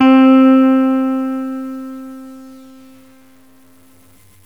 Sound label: Jazz Guitar; GMTones